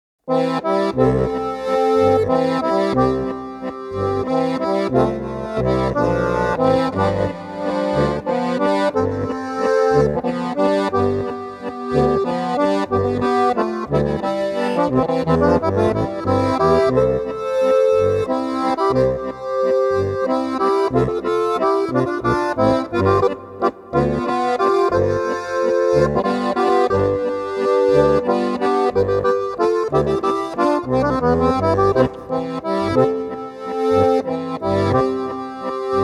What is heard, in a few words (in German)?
GCFB Resonanz Harmonika; klangbeispiele